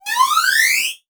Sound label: Multimedia Internet CD-Rom Flash Wipe Cartoon